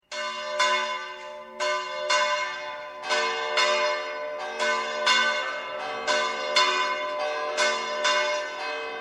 campana

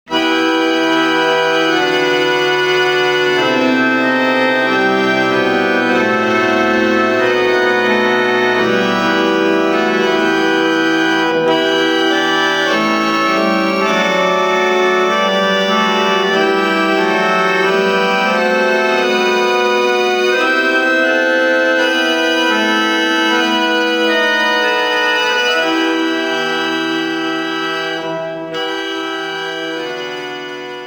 armonio

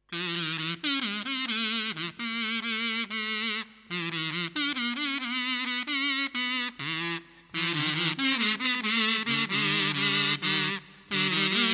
mirliton

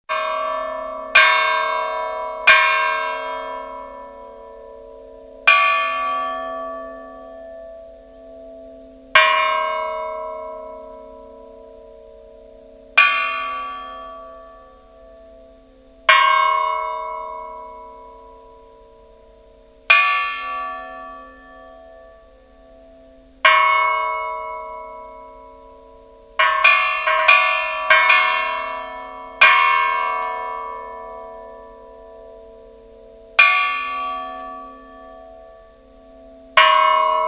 toque difuntos, campanas

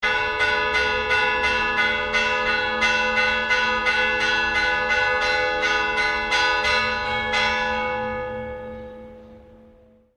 campanas, valladolid

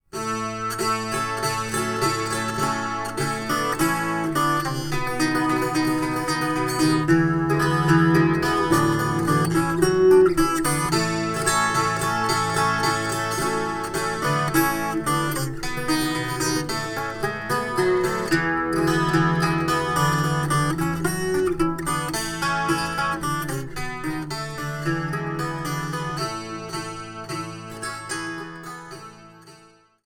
guitarron

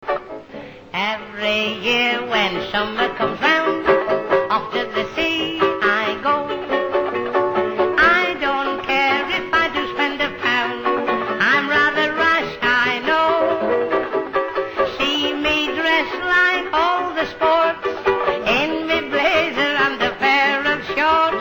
banjulele

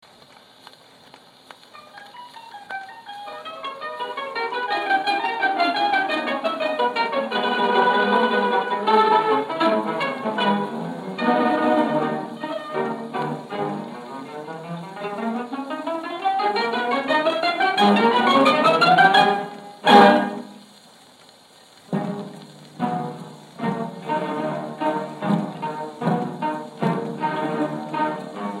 orquesta

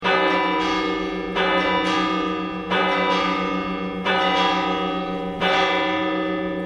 campanas

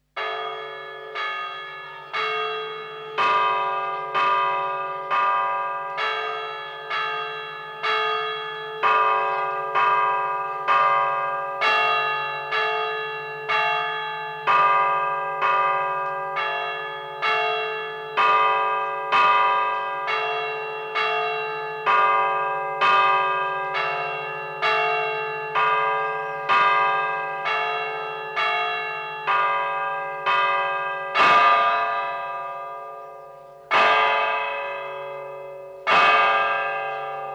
toque de oracion, campanas

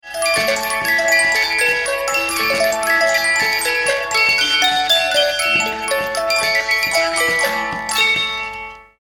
caja rect

